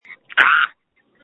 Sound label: screams